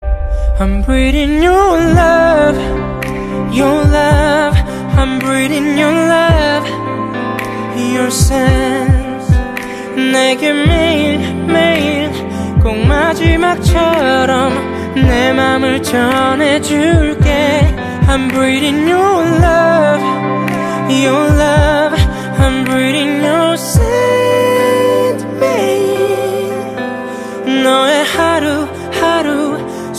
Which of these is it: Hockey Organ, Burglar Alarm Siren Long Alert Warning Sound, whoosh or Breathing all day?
Breathing all day